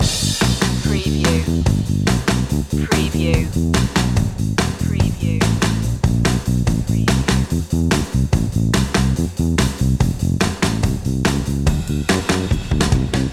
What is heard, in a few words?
driving in my woody loop